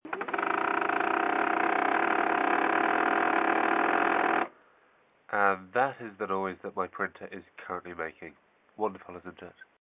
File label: printer problems